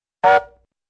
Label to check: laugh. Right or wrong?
Wrong